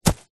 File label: footstep single soccer boot run grass; zapsplat; foley